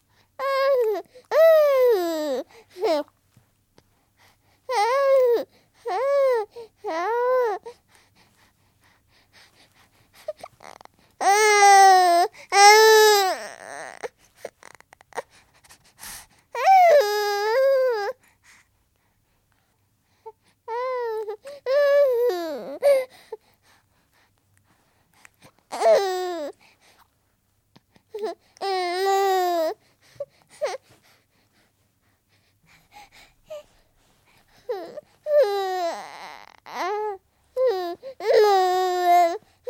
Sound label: 14 week old baby boy, tired yelling; Babies; Voice; BBC